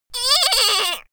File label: little girl cry squeak; soundpool